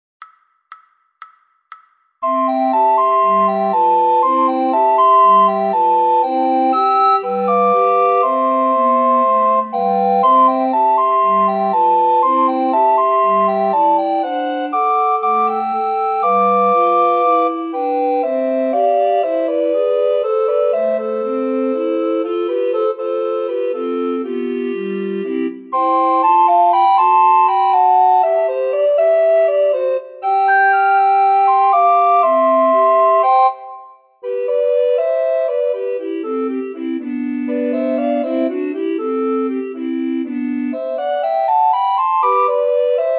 Ragtime, recorder

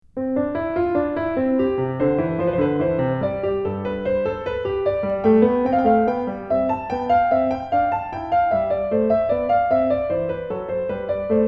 Invention Piano; Bach

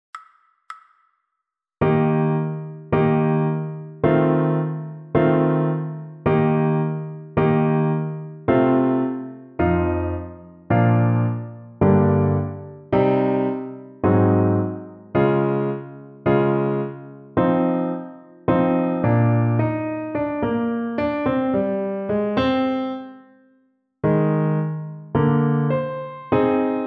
piano

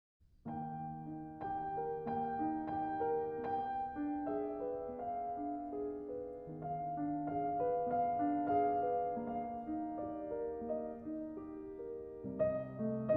Arietta, piano; Grieg; Lyric Pieces, Op. 12

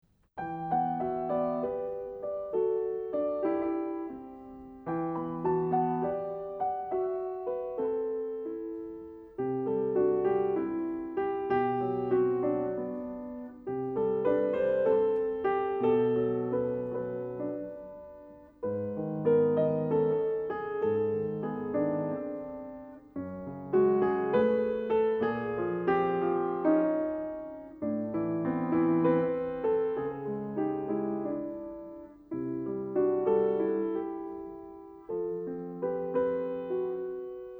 Semplice

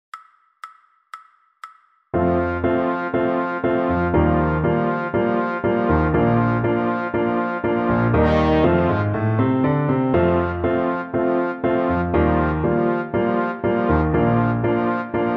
trombone

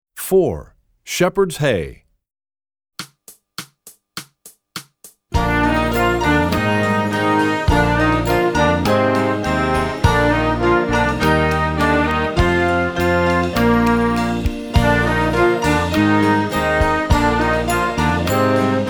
trombone; essential elements

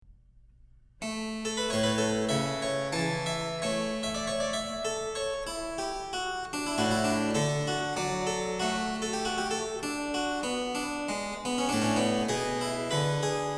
Invention Harpsichord; Bach

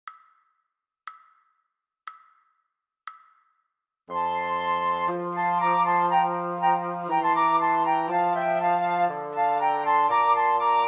Trio fast, recorder; Telemann; 1st mvt

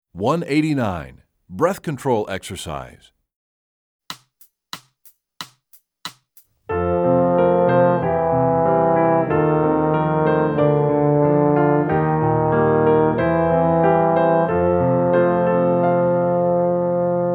Breath Control Exercise, trombone; essential elements

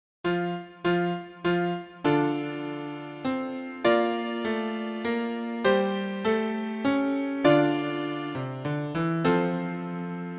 piano; Bach

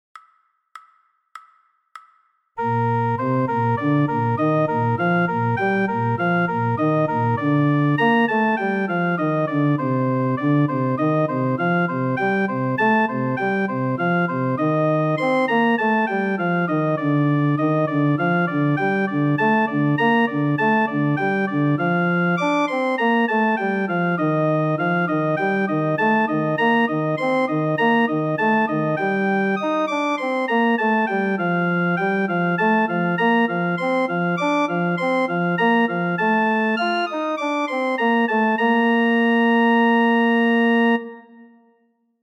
Study trom

